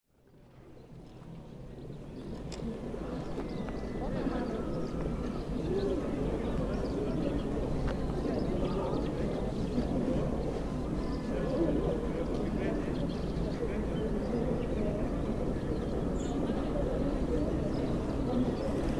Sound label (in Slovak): Košice hlavné námestie park pri kaplnke